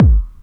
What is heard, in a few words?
BD, Dance Mega Drums; Best Service